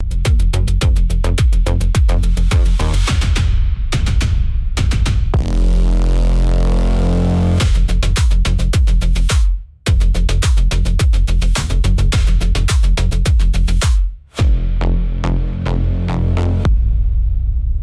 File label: loop; bgm